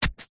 household garden wooden sharp gardening tool stabs into grass soil single